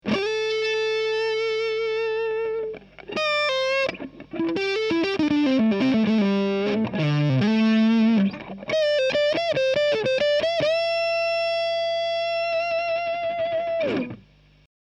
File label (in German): HB Hals Zerre